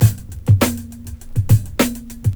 BEAT, Beats 102-105bpm, Hip Hop; E-MU Formula 4000 Series Vol. 1; Hip Hop Nation

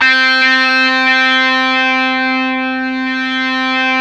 GTR, Dirty; Club-50 - Foundations Roland; Stack

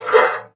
PUSTE, BASSOON; Xsample VOL-6

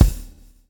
SWNG

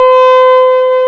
SUB C5, SYNTHESIZER; GLUNGE

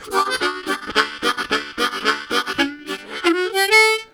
Vamp Walking, Harmonica; Zero G

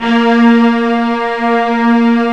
A#3 MEGA STR; ORCH.; ORCH.COMP

